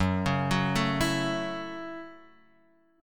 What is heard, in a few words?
F sharp Minor 7th F sharp; arpeggiated; JGuitar